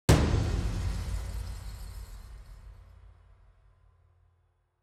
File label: Percussion